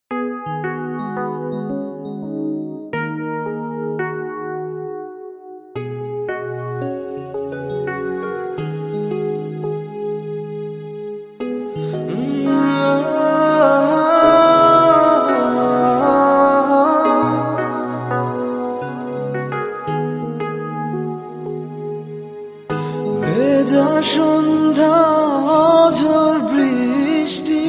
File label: Soft Romantic